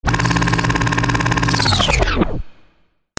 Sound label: CAMERAS